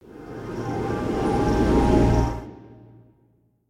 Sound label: time forward; escape from frankensteins tower